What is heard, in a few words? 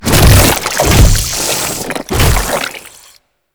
Infested Ancient Toxic Tentacle Swing Flesh